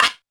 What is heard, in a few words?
technox perc; Quasimidi Technox